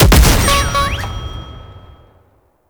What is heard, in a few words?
Clown Barrel; Deep Archimedea; Warframe; Nineteeen Ninety Nine; Deep Archimedia